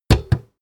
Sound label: button heavy